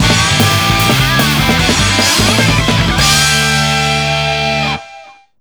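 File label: jingle win; VF5FS; Judgment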